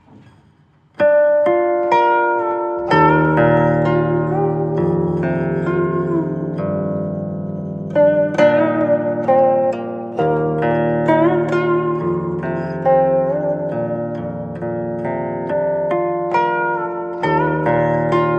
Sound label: Guqin; گوچین